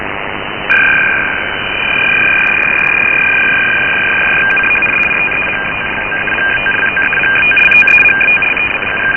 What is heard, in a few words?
6697 RATT base